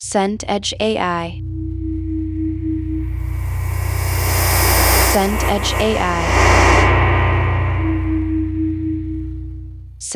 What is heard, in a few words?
storm wind